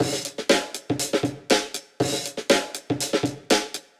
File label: 120 Gritty Studio, Warped Live Drums; Loops; Touch Loops; CosmicFunk